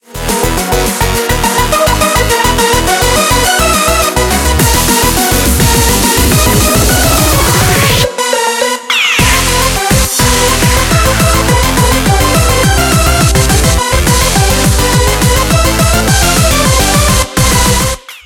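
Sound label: background music crossfade; Invaxion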